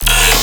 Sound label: Bass Hit D#, Dubstep; Ghosthack; Dubstep Sounds 2025; Luster Bass